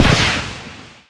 ROCKET RELEASE; Worms3D

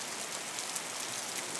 Rain Loop; Worms3D

